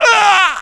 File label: death; Fx; willofsteel